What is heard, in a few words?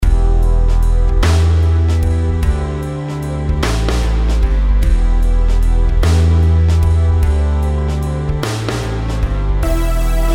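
melodies compound 8s E minor; melody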